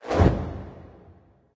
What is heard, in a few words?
trident swing slow; smite; poseidon